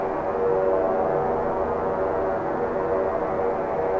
sparse soundscape samples; ReLooped

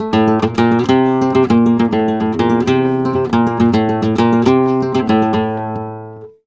Flamenco Guitar Remates De Pulgar, a

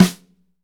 SNR, Snares, Roland; Northstar - Drumscapes Roland

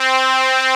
PWM HP32 C3; Analogon

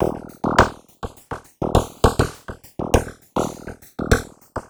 Drum Fx Loop, Electronica

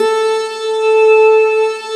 ECHO; AKAI S6000 CD-ROM - Volume 1; BrassWind; WIND&HORN

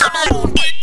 VOX FX; Big Beat Samples & More

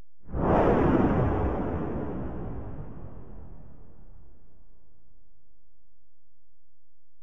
Fx, Alesis DM-PRO Drum Machines; 300 Drum Machines

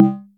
Ana Mid, Electric Tom, Yamaha DTXpress

